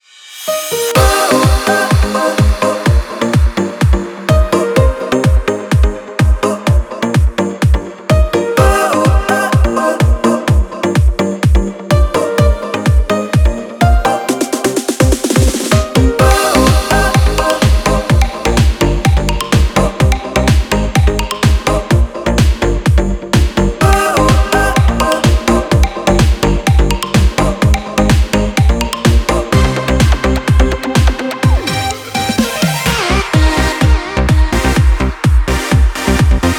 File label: house electronic techno; By Burak Sound Bank